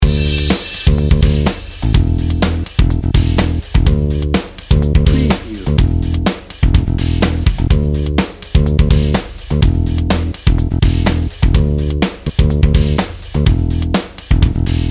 energy field dnb

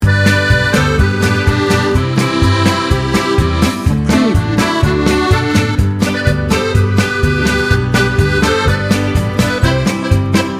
tex-mex fiesta

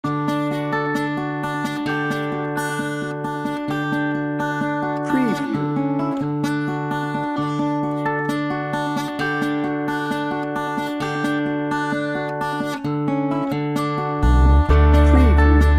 dream maker no drums

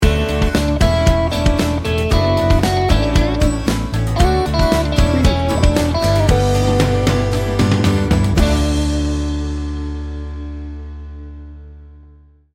breezy sting bumper

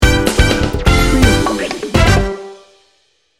celebration funk house sting bumper

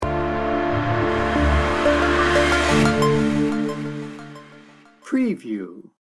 exotic mystery sting bumper

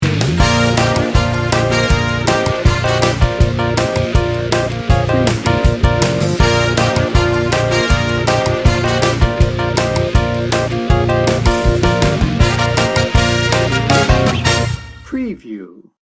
retro memphis blues rock

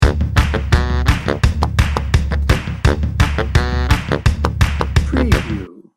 cartoon critters loop

